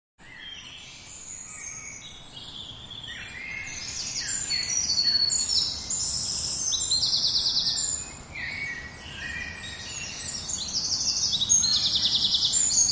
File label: Woodland Birds, British woodland birds in Spring; Environmental (Sound Effects:Nature)